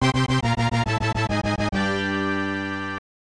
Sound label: Unknown Jingle; Itadaki Street 2; SNES